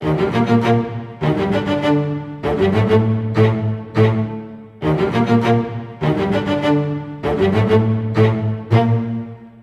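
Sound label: G minor 2, Low Strings, 100; 90 s Sample CDs; PowerFX - Downtown Orchestra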